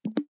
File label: unlock ringtone